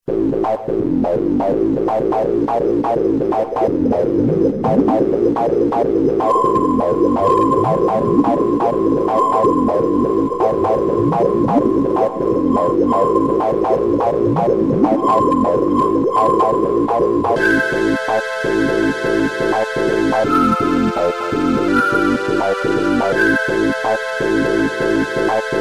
Ingame, Amiga; Bubble and Squeak; Moonchild

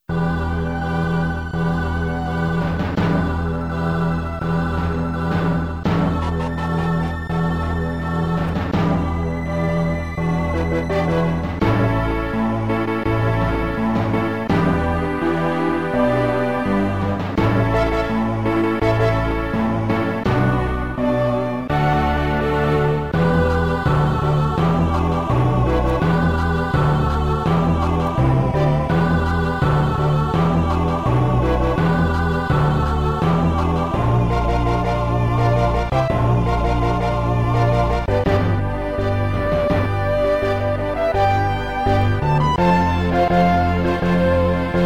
Ouverture, Amiga; Death Trap; Moonchild

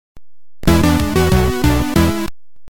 Level Completed; Vixen

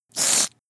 cat hurt

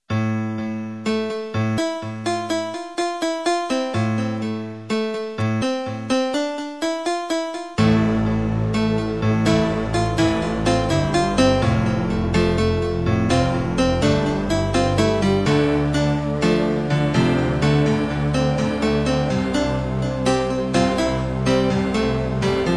High Score, Amiga; Arena; Moonchild

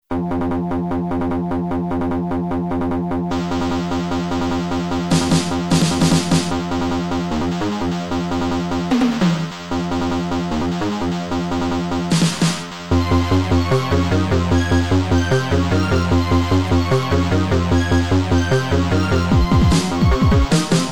Bongo, Amiga; Moonchild

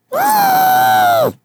cat die

